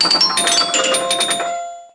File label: Bally Game Over bell